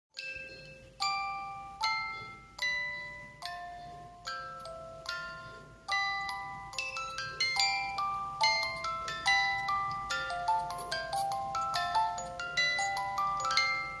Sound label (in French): extrait boite musique canon